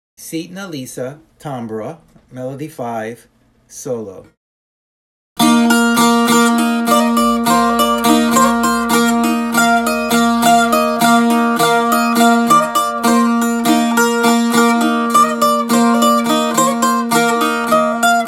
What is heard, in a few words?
tambura solo